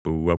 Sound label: center, Keytone sounds; LG; A230